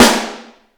sparkle steel snare drum sound a sharp key, snare drum tuned to the A# note